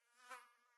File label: amb Insect Oct Up; willyshotchillies